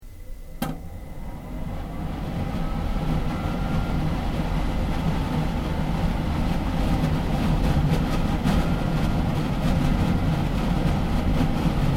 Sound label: FAN EXHAUST ON OFF; Various Machines; Fans (Sound Effects:Electronics)